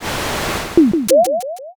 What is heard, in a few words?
gacha roll